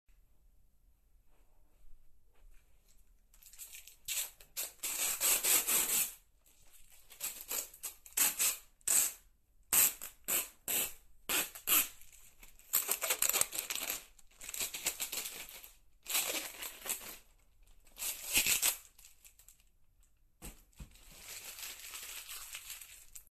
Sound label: Scotch Tape, holophonics; 粘着テープを剥がす音; Holophonics Sound